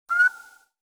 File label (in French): oiseau nuit; foret descriptive